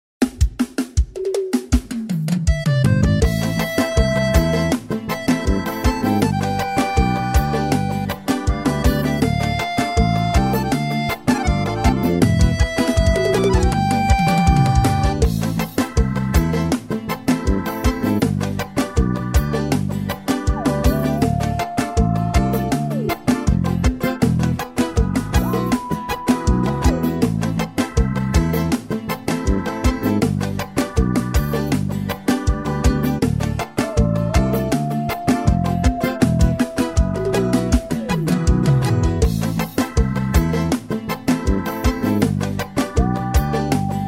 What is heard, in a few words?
Playback Forro, sax alto; Versao Forro; forro cristao sax alto eb